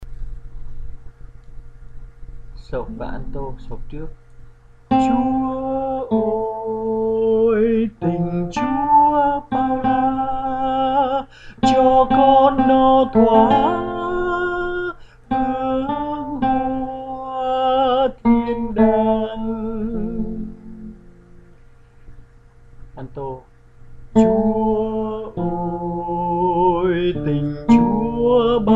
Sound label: sop Alto